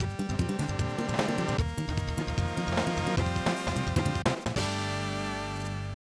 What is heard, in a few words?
Unknown Jingle; Super Formation Soccer 95; SNES